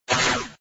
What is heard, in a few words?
Multi Shot; bejeweled deluxe 2001 pc gamerip